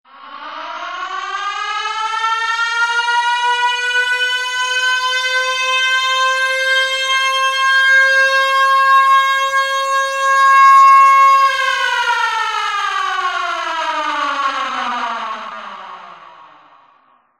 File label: siren